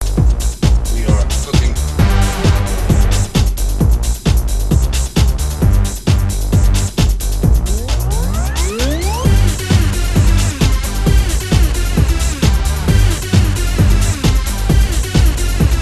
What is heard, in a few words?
tridrone